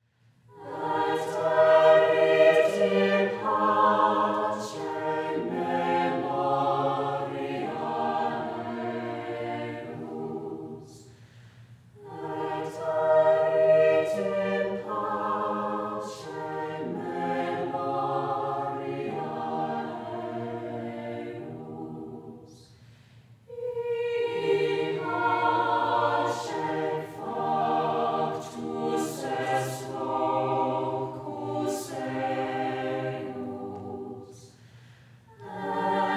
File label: choir chamber miking, Miking a choir from several feet away; Mixing for God audio examples